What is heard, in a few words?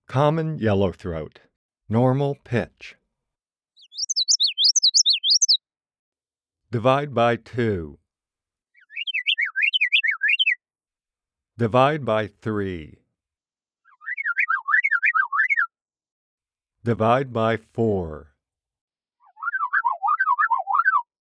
Common Yellowthroat